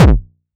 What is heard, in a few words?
Attack Kick